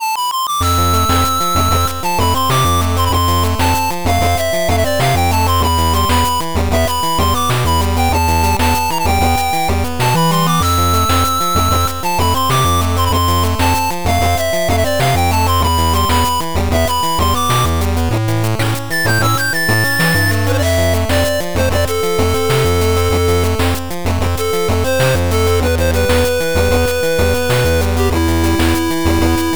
8bit Reaching The Summit

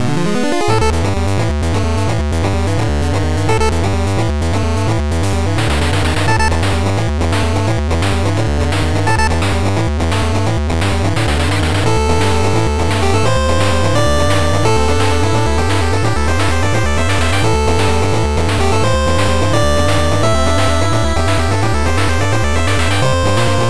8bit

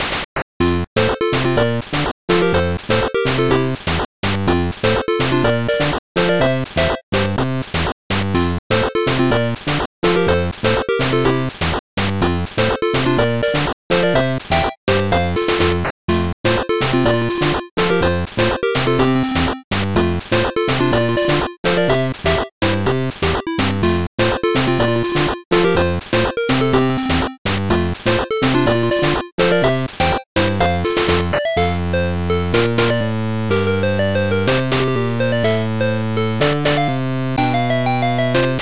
8bit

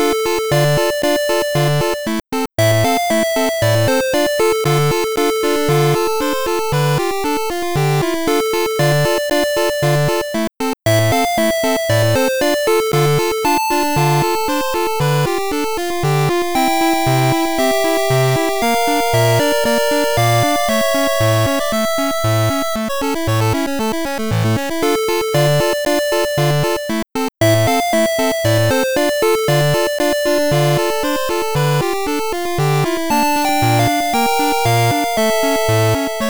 8bit Lonely Journey

